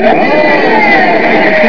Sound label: wrong